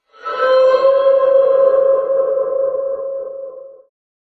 death

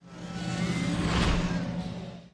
flighter, ambience

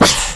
hiss, headcrab poison; hl2

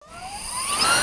spin up, turret; Half-Life

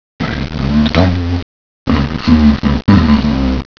hmmm

